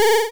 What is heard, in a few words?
powerup, 8-Bit Sounds